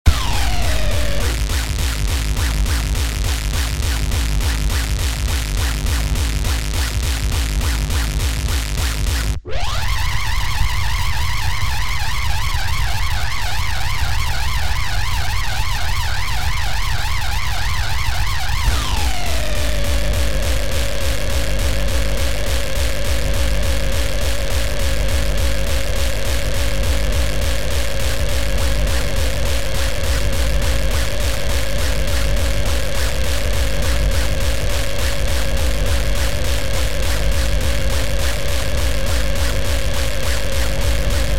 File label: Hardcore Crash